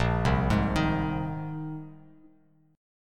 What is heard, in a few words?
A sharp 7th Suspended 2nd Suspended 4th, Bass Standard; arpeggiated; JGuitar